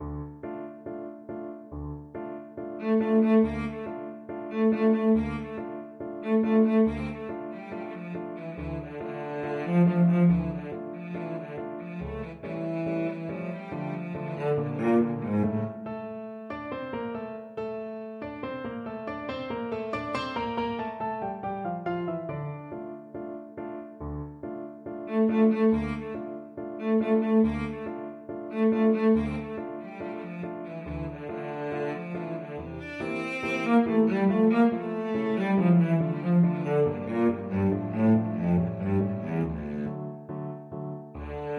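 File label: violoncello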